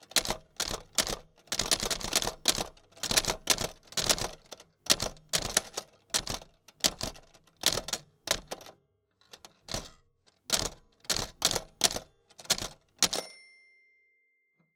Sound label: Typewriter